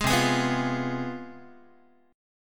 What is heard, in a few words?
C Major 7th Suspended 4th Sharp 5th, down, Guitar; CM7sus4#5 strummed; 11,8,11,9,9,x; JGuitar